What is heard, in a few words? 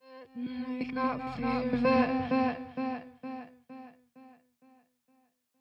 130bpm Am, Vocalpack